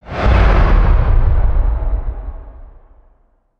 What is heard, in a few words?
car jump